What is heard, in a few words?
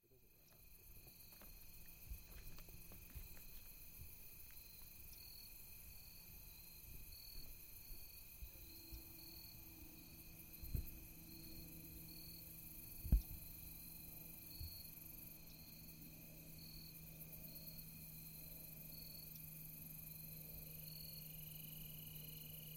dusk harrier hill, New York, USA; Jonah N Buchanan